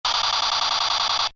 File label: Toy machinegun, Toy, Small: Machine Gun Effect; Toy Guns (Sound Effects:Toys); Stuart Duffield